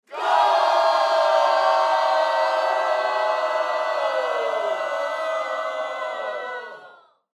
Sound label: crowd goal